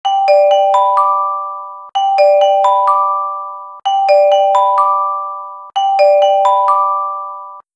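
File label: cbs disaster warning, Japan; CellBroadcast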